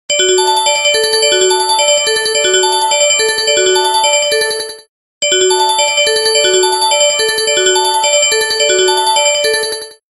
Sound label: Signal; Sony Ericsson; S312